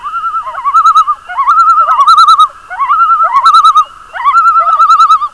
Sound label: loon tremolo